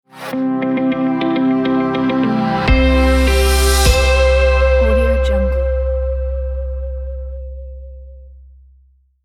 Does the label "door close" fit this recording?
No